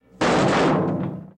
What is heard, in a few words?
Car Door Metal Drop